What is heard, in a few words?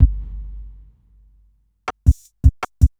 DRUM LOOP ambient 160